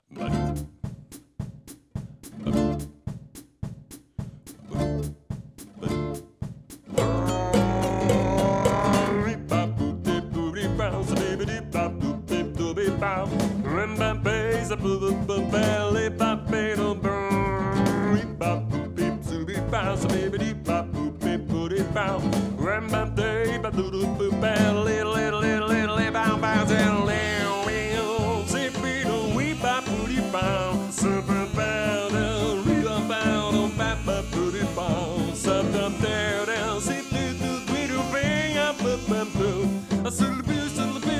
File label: SWING